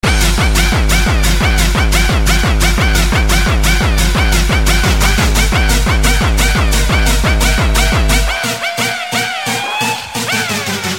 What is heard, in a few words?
hardcore